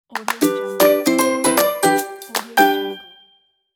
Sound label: baby logo